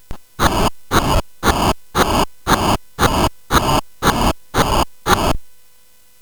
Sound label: Synth Sample, CSG8580R5; hvsc